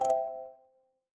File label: feedback checkpoint